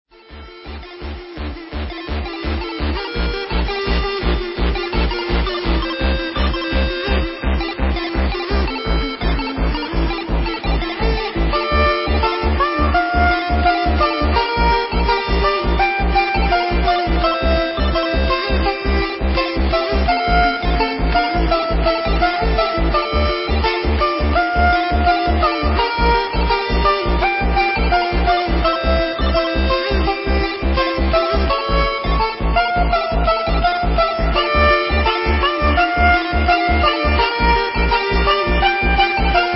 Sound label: Techno, Nightcore Remix